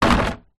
household plant pot large plastic down on hard ground